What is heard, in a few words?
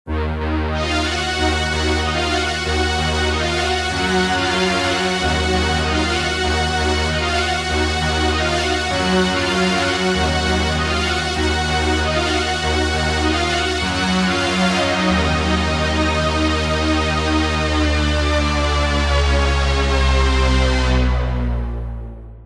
Strings